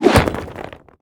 ballista hit building

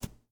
arrow dirt, attack impact

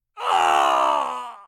male death

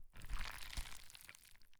gather meat

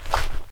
fs rock, singlesteps

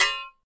shield metal, attack impact

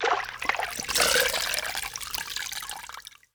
Health Center, completion; 0AD

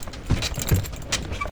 ram move, siege

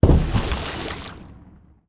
seige impact water; ships siege

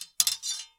sword hit

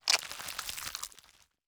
gather meat

